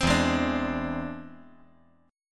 E Augmented 7th E down

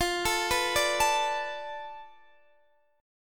F 6th Flat 5th F down Guitar Open D, chord

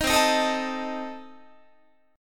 C Minor 6th Guitar, chord; Cm6 strummed; 18,20,18,19,19,20

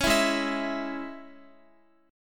Ab Suspended 4th Sharp 5th Guitar; strummed; Absus4#5; x,x,11,9,8,11

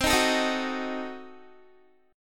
C Diminished 7th, Guitar; strummed; Cdim7; 20,18,19,20,18,x; 4E,4A,5D,5G,5C,6F

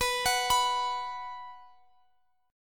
B 5th B Guitar Half Step Down, chord

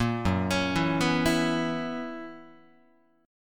Gb 11th, Guitar

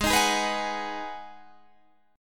Ab Minor 7th down Guitar Half Step Down

